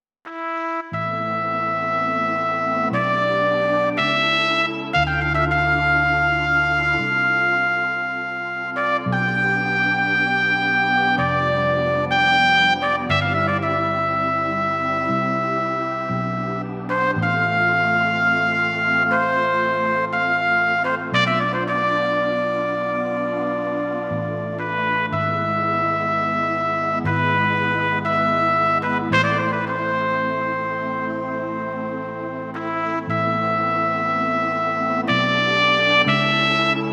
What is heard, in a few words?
Trumpet